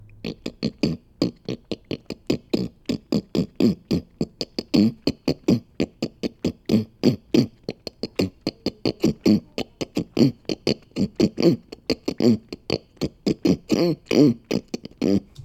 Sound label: Pig Oinks